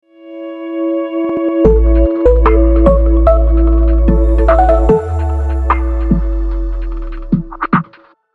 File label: modern tech intro logo